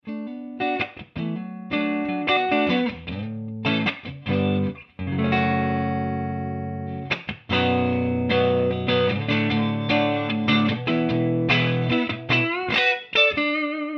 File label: clean